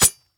Hit; smite; god susano

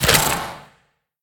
Bite; smite; god bakasura

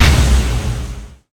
missile; Smite; Zhong Kui; commando

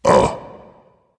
ugh dragon; fafnir

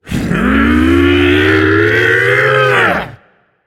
battlecry; smite; xbalanque

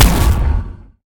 hit; smite; cthulhu

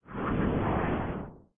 float; smite; thanatos

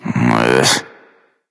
ugh; smite; hachiman

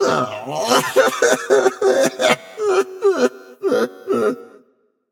boohoo; smite; ares

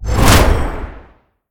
lift; smite; tiamat

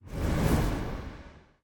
body swish; smite; thanatos

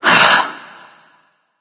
sigh; smite; hebo

